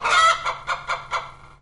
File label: chicken cackle